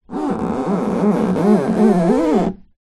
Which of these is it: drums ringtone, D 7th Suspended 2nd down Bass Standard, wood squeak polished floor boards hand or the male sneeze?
wood squeak polished floor boards hand